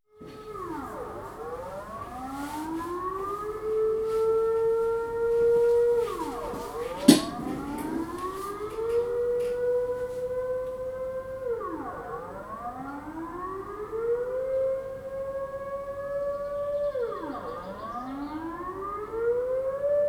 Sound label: work sirens; fieldrecs